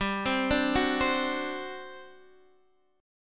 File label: G Major 7th Suspended 4th, G